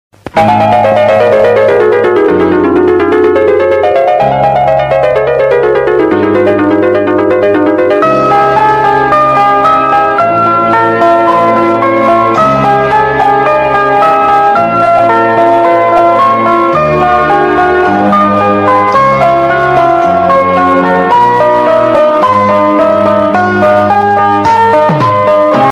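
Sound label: tamil old ringtone background music; mouna ragam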